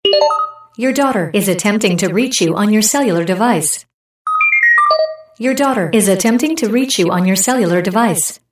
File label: Sms